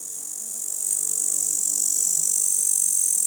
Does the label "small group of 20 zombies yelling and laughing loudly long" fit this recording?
No